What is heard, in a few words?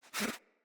Pet Alert Snort; Catbrow; Pet Ship